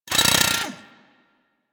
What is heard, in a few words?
Drill Punch; Warframe; Grineer Ghouls; Ghoul Drill Sergeant; Attacks